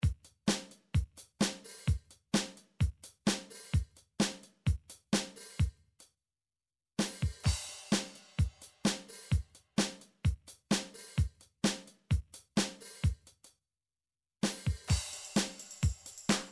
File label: drum midi files dance; Drum Midi Files Collection